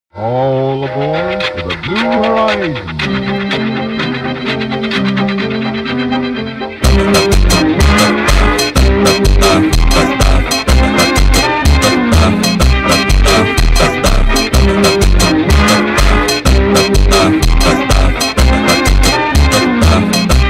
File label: funk slowed